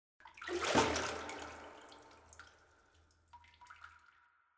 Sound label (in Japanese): お湯からあがる リバーブ; おまけ 単発効果音